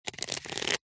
household hairbrush plastic bristles finger run through; zapsplat